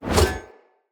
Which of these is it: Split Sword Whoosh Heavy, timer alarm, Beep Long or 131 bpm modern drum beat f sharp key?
Split Sword Whoosh Heavy